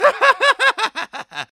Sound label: Laugh